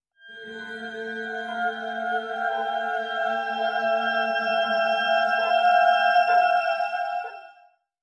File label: Beat Sting